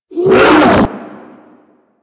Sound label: Infested Jetpack Attack Melee Swipe; Warframe